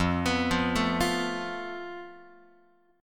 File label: F Major Flat 5th, Guitar; arpeggiated; 4A,4E,5A,5D,5Gsharp,6Csharp; x,1,2,3,1,4; JGuitar